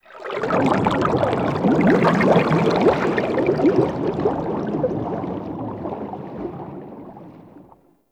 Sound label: Sharkwing Movement Bubble, Vehicles; Warframe